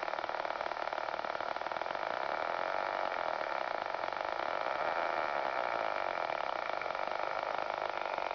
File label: ig noise